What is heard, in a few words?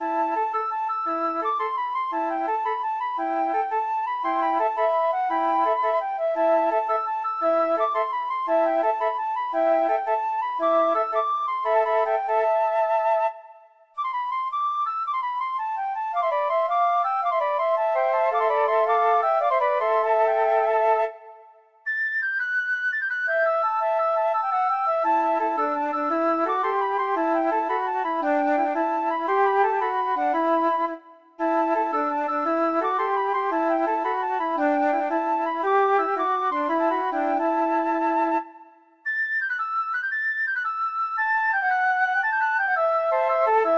medeival dance for flute trio